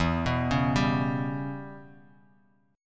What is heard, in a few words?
E Minor 6th chord; Em6 arpeggiated; JGuitar